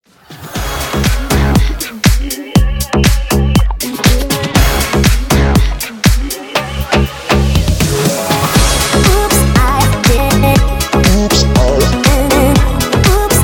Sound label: House Beatshow 120BPM